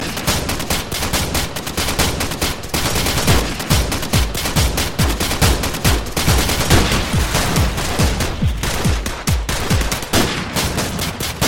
FD All call; Streator FD

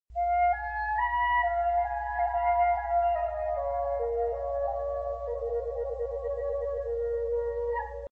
Native American Flute

